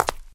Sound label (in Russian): grubij shag na nebolshom kabluke